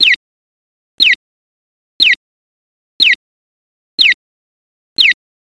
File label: east west chirp; toronto; Accessible Pedestrian Signals (APS), formerly known as audible pedestrian signals